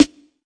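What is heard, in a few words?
classic hip hop Snare; Classic Hip Hop Mega Kit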